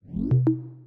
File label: battery level medium; wonderboom